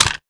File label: weapon foley drop; Real Guns Sound Pack 2; Mono